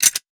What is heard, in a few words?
weapon foley pickup; Real Guns Sound Pack 2